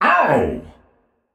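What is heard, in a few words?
non verbal ouch, voice; cernunnos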